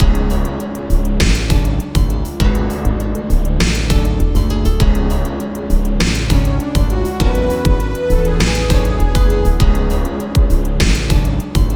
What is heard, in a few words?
Tension Loop; Gravity Circuit